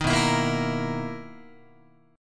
B-Major 11th Guitar, BM11 chord; strummed; JGuitar

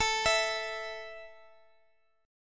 A 5th A down Guitar Standard, chordshape; arpeggiated; capo=2

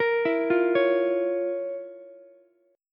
F Major 7th Suspended 4th Sharp 5th down Ukulele; FM7sus4#5 arpeggiated; JGuitar

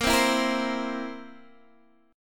Bb Suspended 2nd Flat 5th Bb down Guitar Open D

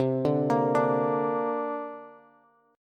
C 7th Suspended 2nd down Bass; C7sus2 arpeggiated; JGuitar

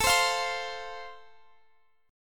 A Minor Sharp 5th A, Guitar, Standard; strummed; x,x,19,17,18,20; capo=2

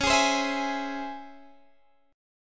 Db Minor Major 7th Db; strummed; x,16,18,17,17,16

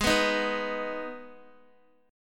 G sharp Minor G sharp down Guitar, chord; G#m strummed

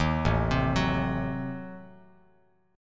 A Diminished 7th A down Bass Standard

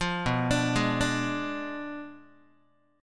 B Diminished 7th B down Guitar; Bdim7 arpeggiated

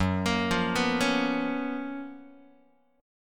F sharp Major 11th, down, Guitar; 4A,4E,5A,5D,5Gsharp,6Csharp; arpeggiated

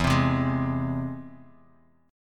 E Augmented, Bass Standard; E+ strummed; JGuitar

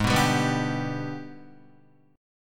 Ab Minor Major 7th Flat 5th, down, Guitar; AbmM7b5; strummed

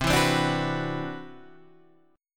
C Major Sharp 11th, Guitar Half Step Down; CM#11

